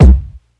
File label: wet kick single hit C key, bass drum one shot tuned to the C note; single hits one shots